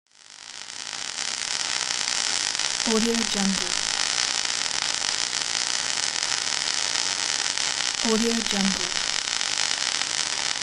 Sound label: electrical spark strong